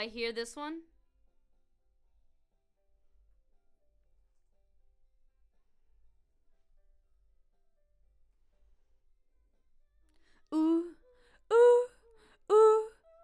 BG Vox

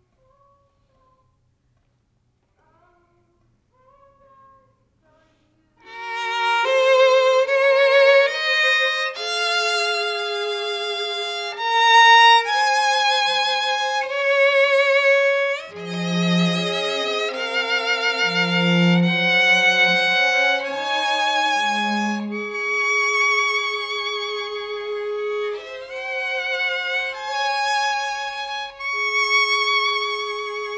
violin

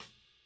Kick In

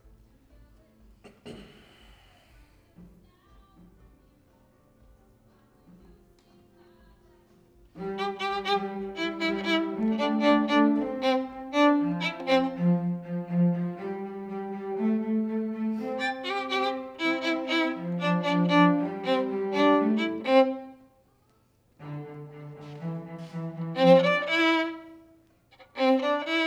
violin

